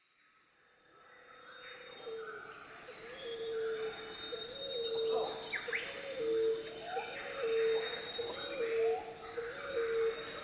tropical rainforest with pacific emerald doves singing and white-throated treecreepers